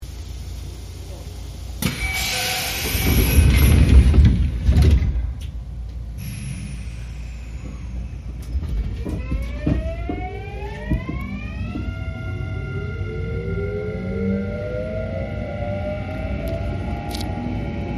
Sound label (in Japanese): 近鉄シリーズ21（IGBT-VVVF） 福→伝法 / 阪神線内高加速モード